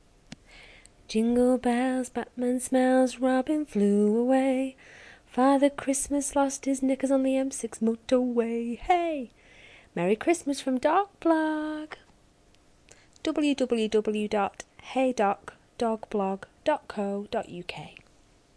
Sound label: jingle bells